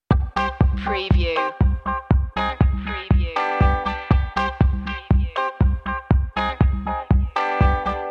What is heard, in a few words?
latin dancing loop intro; media music now